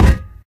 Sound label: physics cube